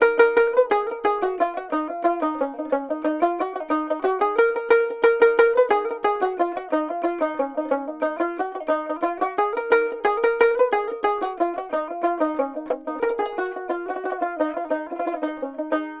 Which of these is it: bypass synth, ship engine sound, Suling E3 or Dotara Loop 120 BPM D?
Dotara Loop 120 BPM D